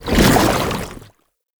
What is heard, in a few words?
Goo Layer, Footsteps; Warframe; Lasrian; Lasrian Soldiers; Foley; Lasrian Chem Strike Nox